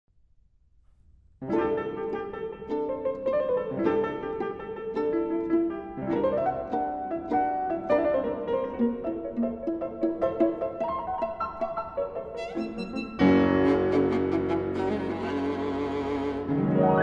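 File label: viola sonata; clarke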